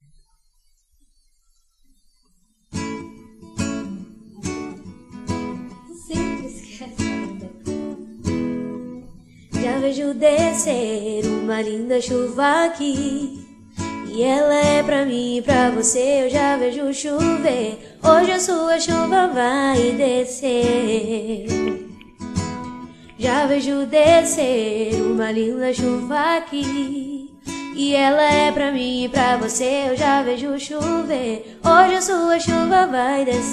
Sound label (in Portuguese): voz violao